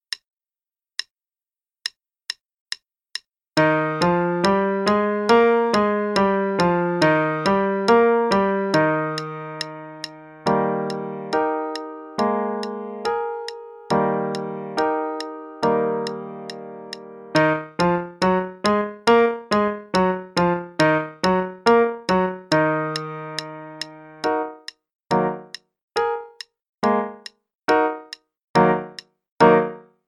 Warm-up in Eb (Major then minor, qn=140); minors are staccaco; Faber; Level 2A, 2nd Ed p.63 - Eb Major, then eb minor

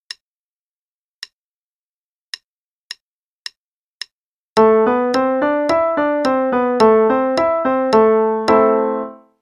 a minor Pattern (qn=108); 5-Finger Pattern; CM Level Prep

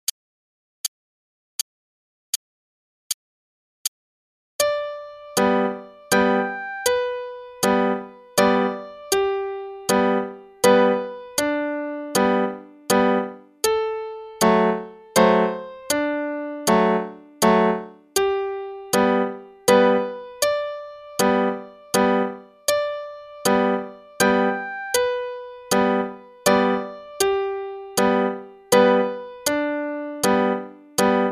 Spring Waltz (qn=80); Play-along; Spindler

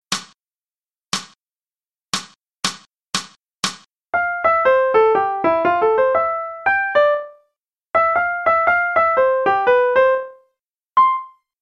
Transcribing Rhythms; swinging

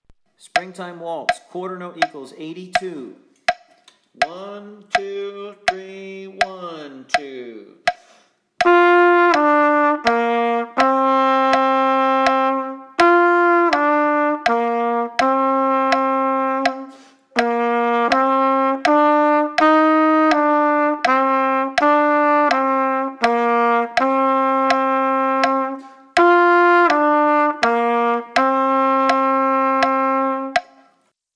Play-along, Waltz, qn=82, trumpet; Springtime Waltz; trumpet with click; Behr, Franz

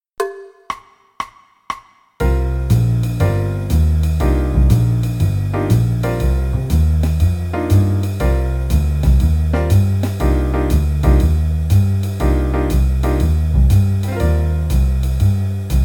Improv C min 64 bars (qn 120), Jazz Piano

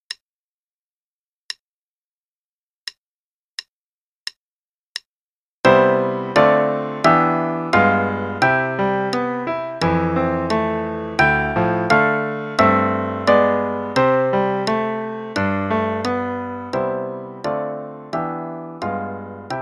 C Scale, both parts, qn=88; Level 0 - 0 Primer, Book A - page 73; My R.H. C Scale

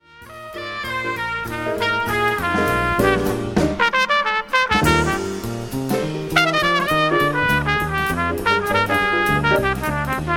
trumpet solo only

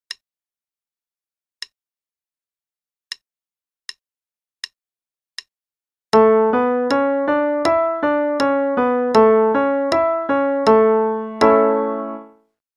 A Major Pattern qn=80; 5-Finger Pattern; CM Level Prep; Daily Warm-ups

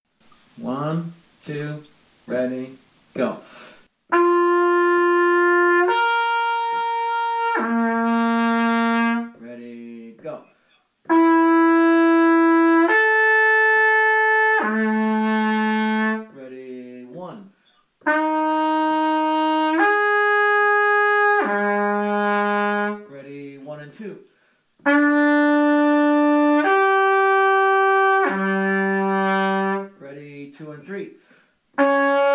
Play-along - slurs; Technical; The Routine; V. Slurs

